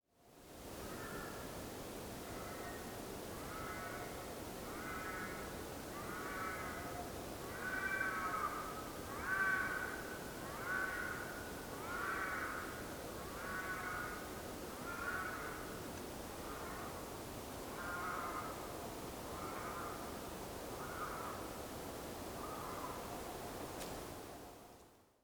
european wildcat felis silvestris; myaukanya koshki

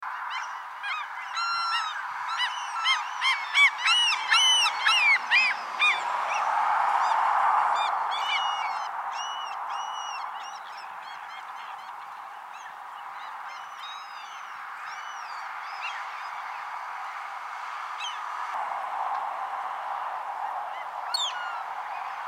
short billed gull larus brachyrhynchus

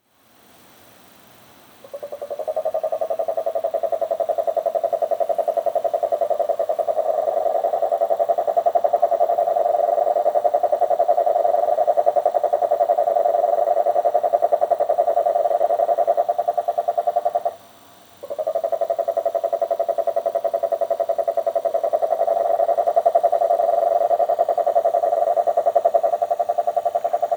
marine toad rhinella marina; lyagushek i zhab

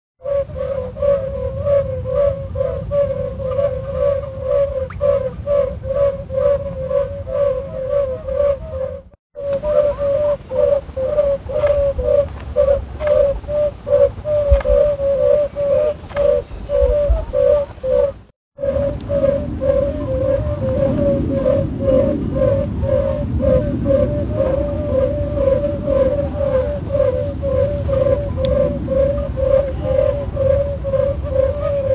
yellow bellied toad bombina variegata; lyagushek i zhab

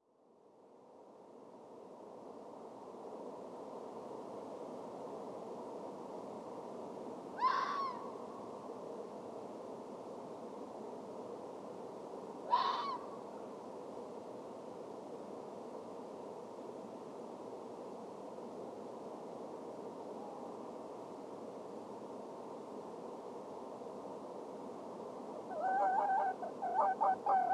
red fox vulpes vulpes; lisy

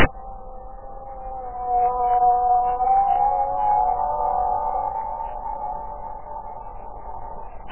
whale herd in the ocean

